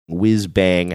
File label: whiz bang